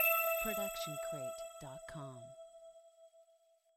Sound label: tonal blip; soundscrate